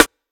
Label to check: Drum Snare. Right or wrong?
Right